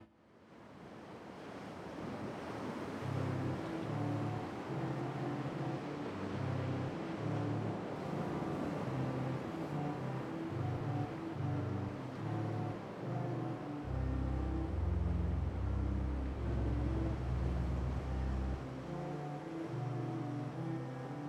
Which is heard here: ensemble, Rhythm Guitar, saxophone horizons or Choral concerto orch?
ensemble